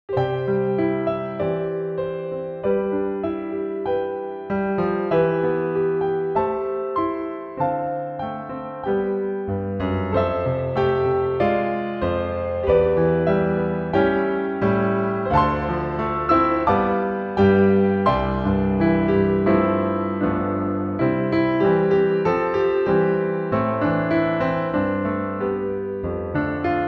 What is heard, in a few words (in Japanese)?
豊根の歌 piano